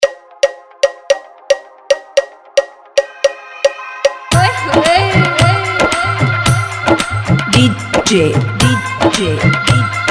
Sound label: Bolllywood Remix